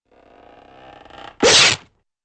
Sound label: cloth stretches and rips